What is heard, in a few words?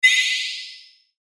Shimmer Ascend; Multimedia; Blastwave